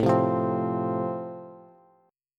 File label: A Minor Sharp 5th A, Bass; 17,15,15,17; strummed; JGuitar